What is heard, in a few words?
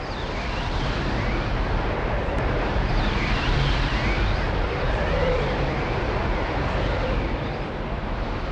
wind loop